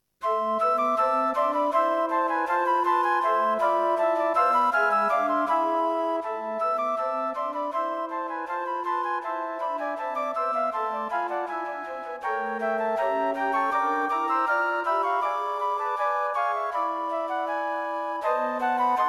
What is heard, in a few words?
Flute; Flute quartet; 3 Flutes and an Alto Flute.